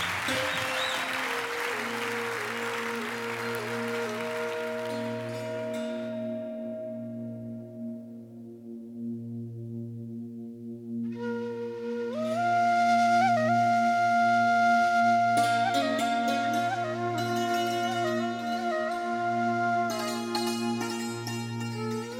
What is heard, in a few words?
intro flute; Ile de la Réunion